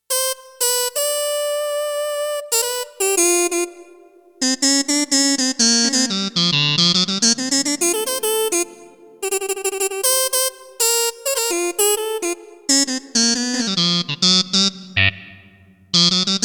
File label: TRUMPET, Roland JX-1; Factory presets